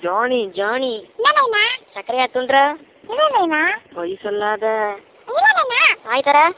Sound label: latin nights